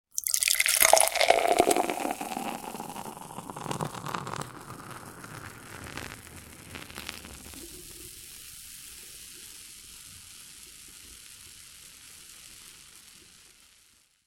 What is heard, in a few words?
beer pouring into glass sound effect